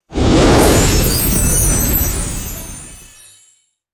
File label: magical shimmering ui chime with fiery whoosh